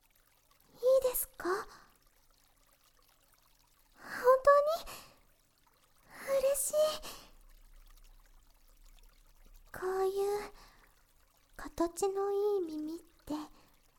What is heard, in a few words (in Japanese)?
こっそり、えっちな耳舐め